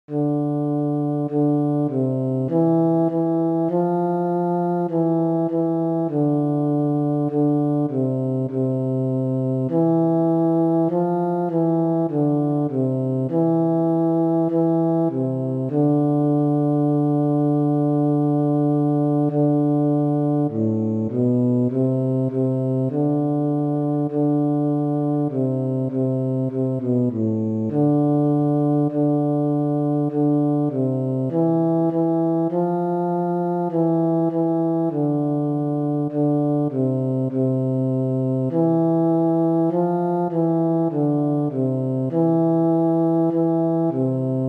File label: bass